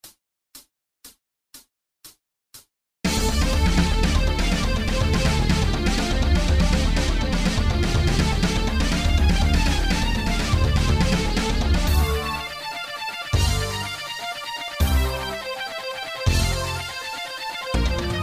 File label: cross fitness rock; the round table; exhibit sound